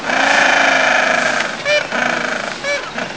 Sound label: gentoo penguins, Antarctica